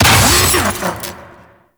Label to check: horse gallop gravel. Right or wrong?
Wrong